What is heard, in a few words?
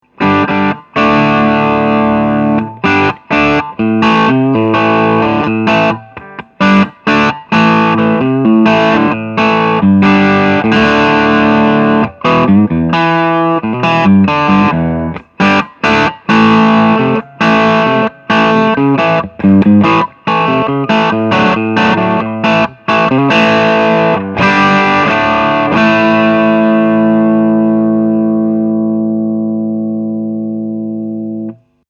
crunch; kong solidthirty